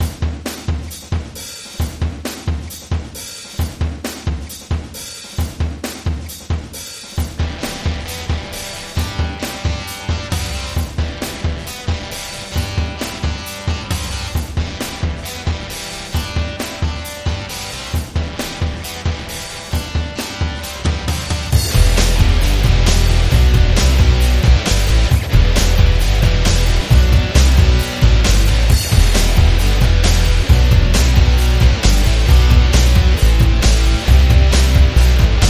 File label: Feel The Rhythm, Instrumental